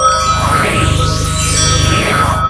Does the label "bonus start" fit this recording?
Yes